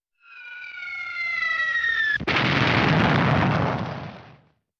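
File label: Whistle of aerial bomb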